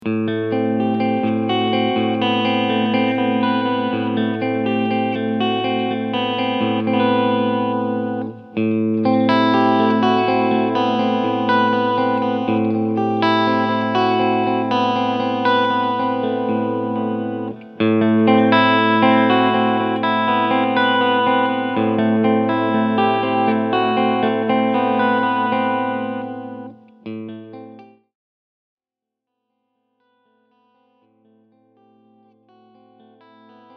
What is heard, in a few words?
S 500 Alle PUs Clean Tone Knob Angehoben; GL Tribute